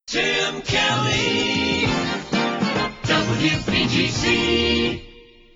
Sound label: jingles logoset; priority one